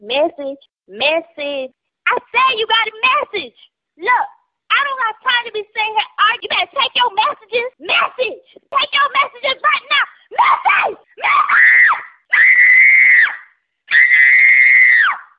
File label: Important Message